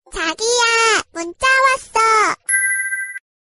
nada dering pesan

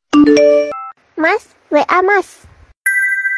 nada dering pesan

